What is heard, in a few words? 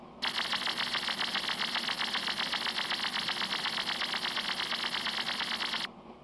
linux invalid idle usb stream